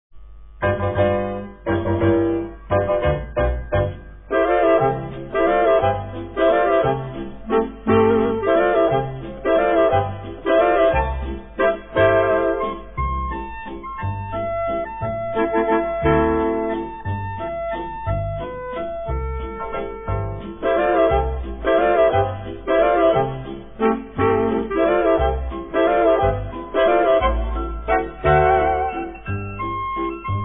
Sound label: Waltz; Nederlandsche Omroep; historische radiofragmenten